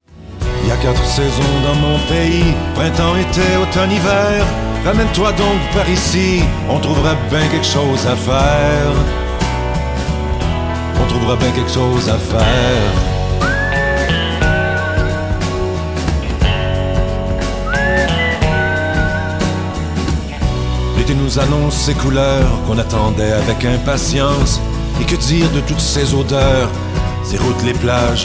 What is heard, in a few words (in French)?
guitar voix